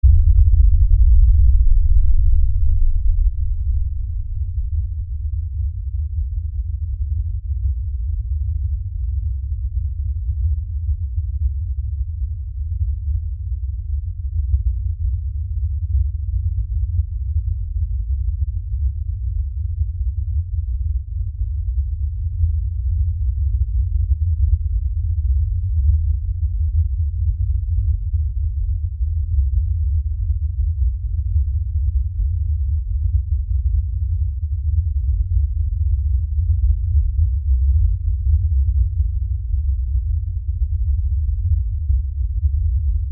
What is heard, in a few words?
LFE Rumble, Lfe Rumble Low Bass; Rumbles; Blastwave